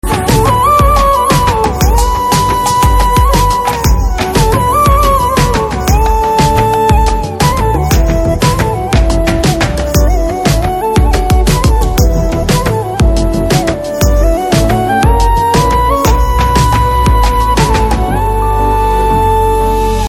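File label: background music Telugu Ringtone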